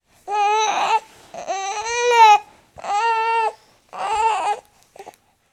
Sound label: Baby cry